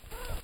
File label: C diana L, dianas